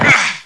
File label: OUCH4C